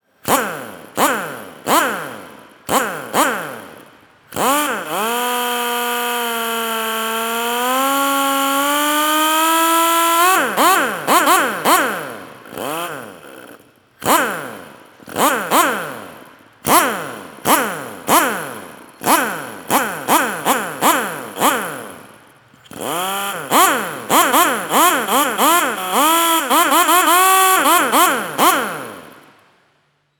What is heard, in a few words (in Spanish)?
Pistola Neumatica